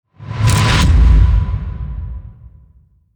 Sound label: Intensify Hit Swell; Ninja Tracks